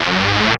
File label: DR. LOOP, BUZZ; X-Static Goldmine 3